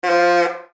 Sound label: short comedy horn blow; sound ideas